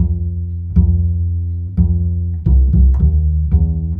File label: bass, Loops